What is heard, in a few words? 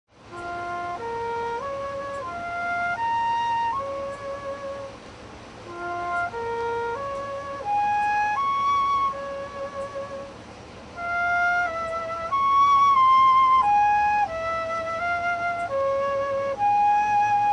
flute